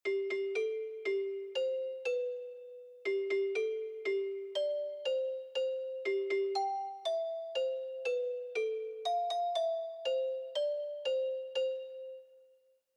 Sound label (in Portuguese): Parabens pra Voce Melodia